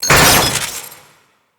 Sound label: Weapon Disarm; Warframes; Loki